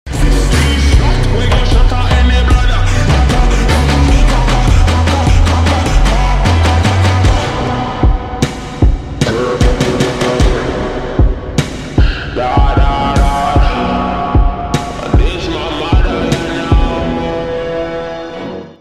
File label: slowed + reverb